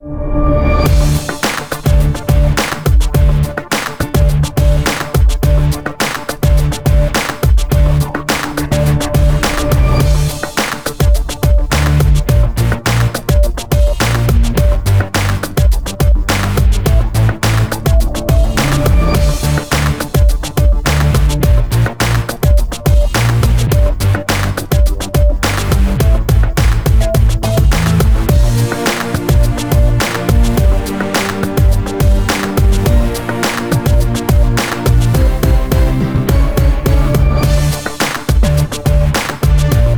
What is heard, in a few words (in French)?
Rythme; Bratz Kidz; NDS